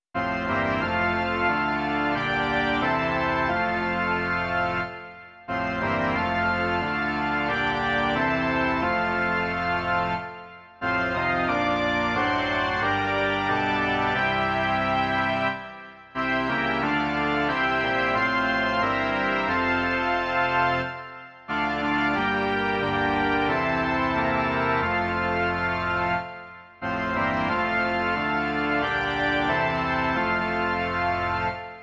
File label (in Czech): varhany; Tys, ó Pane, síla má